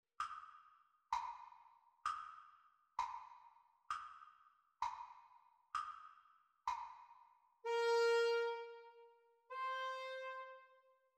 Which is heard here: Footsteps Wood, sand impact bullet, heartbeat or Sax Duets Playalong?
Sax Duets Playalong